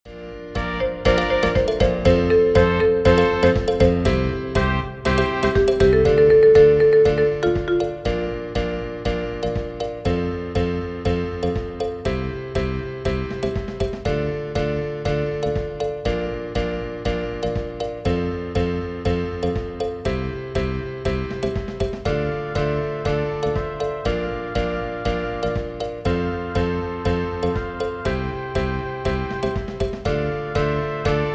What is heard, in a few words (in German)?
Gesang Strophe 2 nur Begleitung